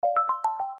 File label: alert ringtone